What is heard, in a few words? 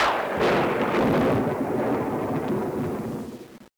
Castle thunder